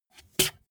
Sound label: bmx bike tire foot scuff medium